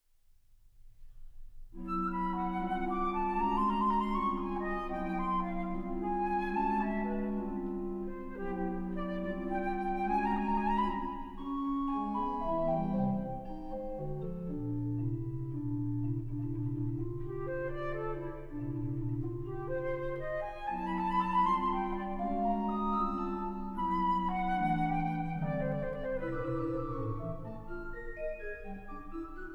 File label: Flute and Organ - Prelude